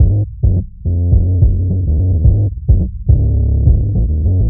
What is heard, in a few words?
bass, Loops Phonky